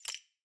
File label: submenu notifications open